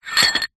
household small ceramic sugar pot lid turn twist on base; zapsplat